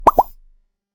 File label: pop